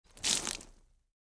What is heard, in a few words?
Liquid Splash On Dirt